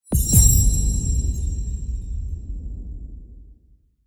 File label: UI Objective Completed; A Year of Rain